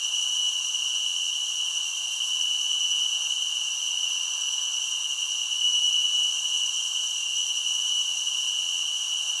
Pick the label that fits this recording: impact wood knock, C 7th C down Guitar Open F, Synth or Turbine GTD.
Turbine GTD